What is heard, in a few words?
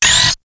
guns gun player zoom